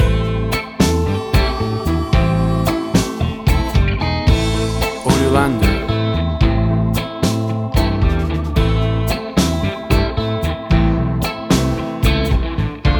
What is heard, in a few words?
Blues Tango